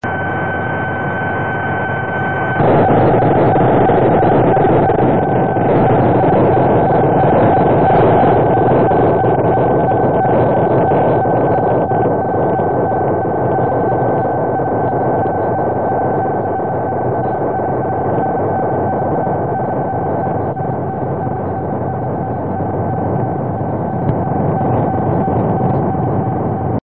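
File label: am, satellite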